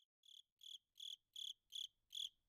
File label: cricket